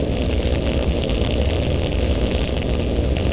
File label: torpedo burn; Star Wars Knights Of The Old Republic II; XBOX